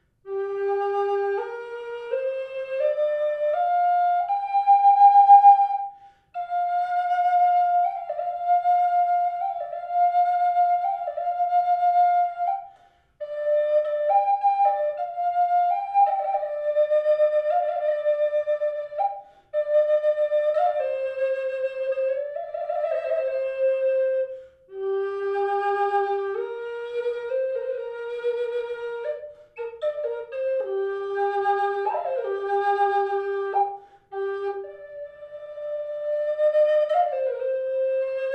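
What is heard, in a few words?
reverb, flute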